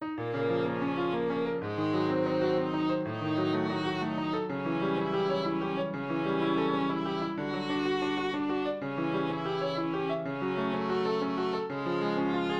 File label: triplets